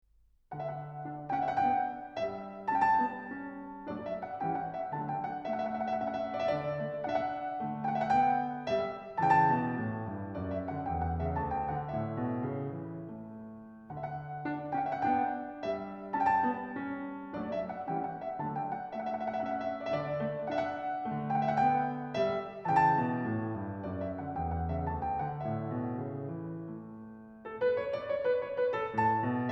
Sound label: Partita no. 4 in D major, BWV 828 - Menuet